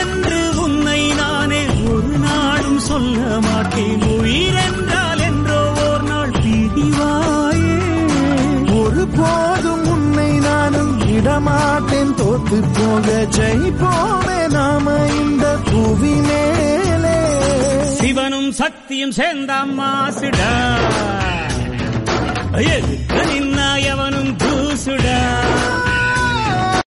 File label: tamil song